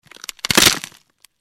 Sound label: crack, Medieval Weapons Sound Effects